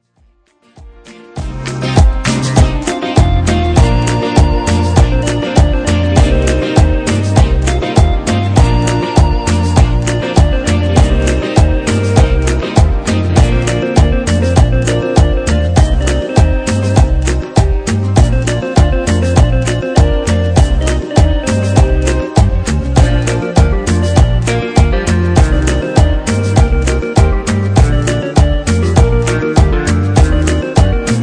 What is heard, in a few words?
Cumbia